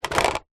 leisure toy gun rifle plastic set down on carpet

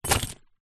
car keys place down on car seat

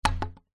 pipe pvc plastic large bash end on ground; foley

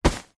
fabric bag soft full of clothes grab; zapsplat

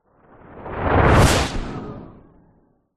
sound design whoosh wind howl smash; zapsplat

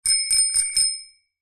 bicycle bell ring; vehicles; zapsplat

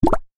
bubble pop

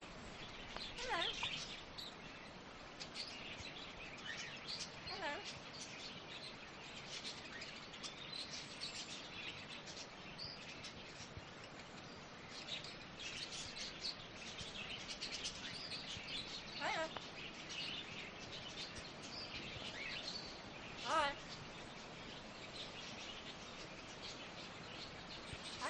urban farm parrot chatter and background birds

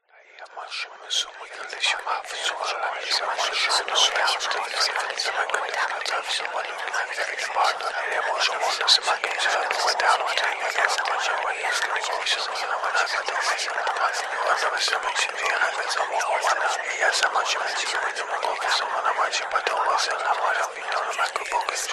horror whispers ghosts nightmare

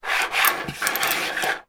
coins slide around on hard surface; foley

